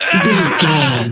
voice male death

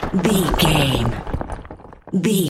rock smashable falling debris

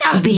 voice male hurt pain comedy

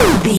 retro impact hit

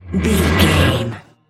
metal scrape deep grind squeak

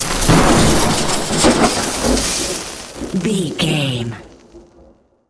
elec lightning magic spell

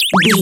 retro collect pickup item

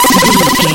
retro laser beam

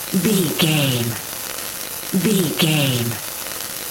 fuse burning bomb dynamite loop

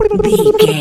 voice fun character flutter man

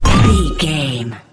magic deflect spell impact

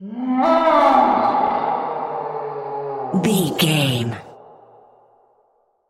voice monster roar growl groan distant

